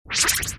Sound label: popup appear